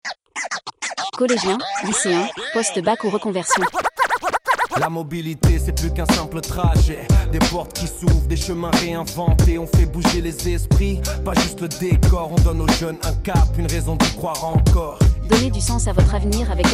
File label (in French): radio generique